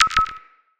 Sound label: Objective Beeps